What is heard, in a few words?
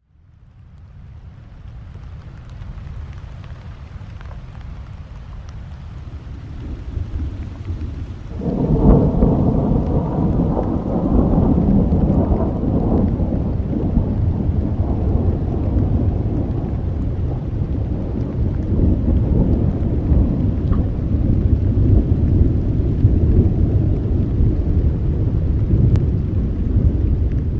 Thunder and Rain